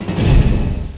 DEATH

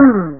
Bow Thwang; Realmz

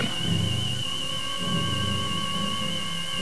tricorder louder; Star Trek

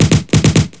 hm chgun

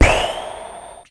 pop all; Pop the Wheezil

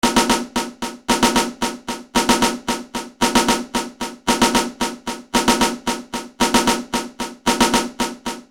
Riff

